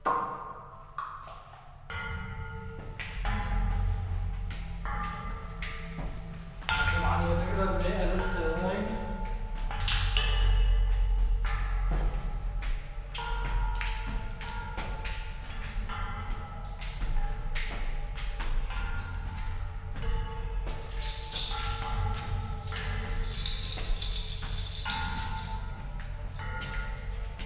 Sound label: stairs